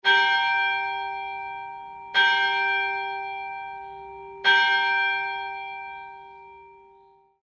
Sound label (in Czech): zvon lukas; zvonohra